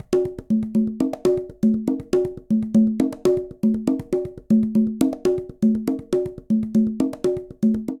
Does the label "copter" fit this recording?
No